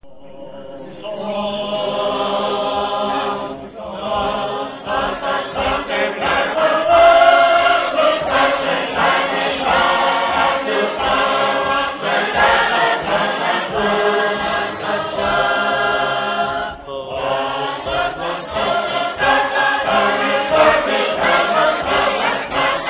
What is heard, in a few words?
harp